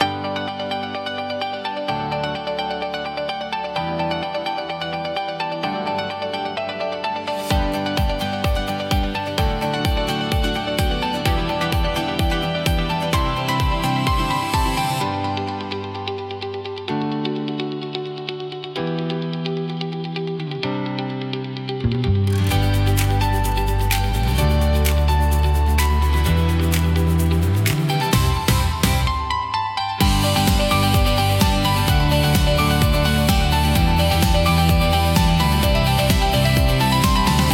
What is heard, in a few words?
corporate background music; productivity hack